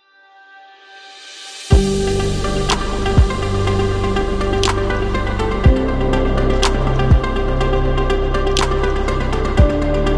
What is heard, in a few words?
Corporate Ambient